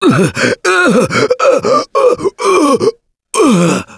Choke, russian, Survivor Voice; Gambler

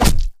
sword hit lo armor lo dmg; Mount&Blade Warband

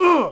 Archer Hit; Sid Meier's Civilization 4 Complete; Warlords; Units

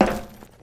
metal, footsteps; Counter-Strike Global Offensive

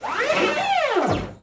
Servo Med; Sid Meier's Civilization 4 Complete; Beyond the Sword; Final Frontier; Units

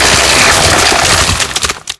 chainsaw gore; infected

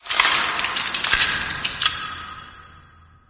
debris random, ambient

